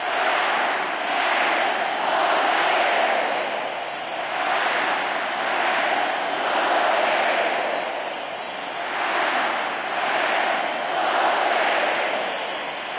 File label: crowd Chant Loop; netent